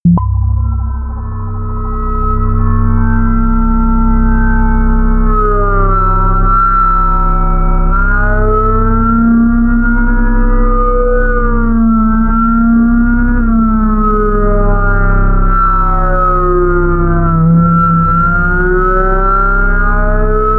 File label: drone agitate